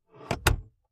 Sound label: Nissan Sentra interior point of view as glove b; glovebox